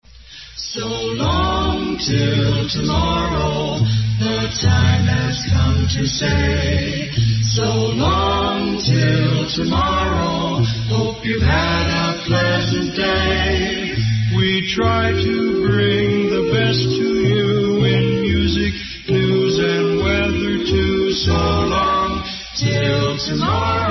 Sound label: signoff jingle; kwmt